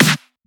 Snare; FLPRO

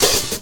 Hit, Percussionz

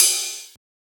Ride; FLPRO

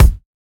Kick

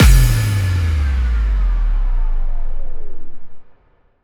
Kick Stop

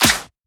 Shifted Clap; FLPRO

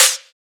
Open Hat; FLPRO

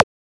Transient; FLPRO

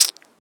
Shaker; FLPRO

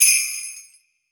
Perc, Boom Bap Drum

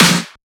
SnareClap; FLPRO

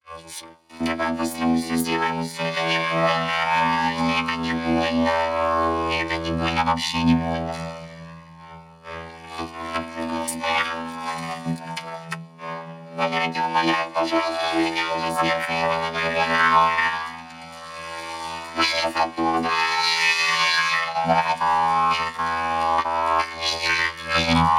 Robot

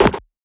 Perc, Percussion; FLPRO

